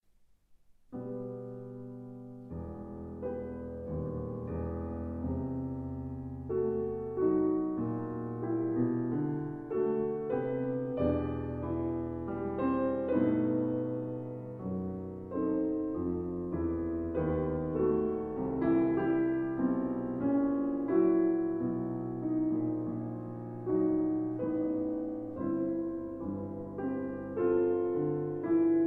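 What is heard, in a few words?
violin sonata; beach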